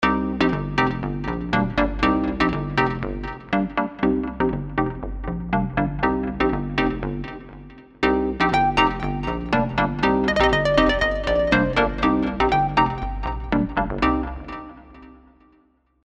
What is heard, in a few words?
balearic plucks; Synthix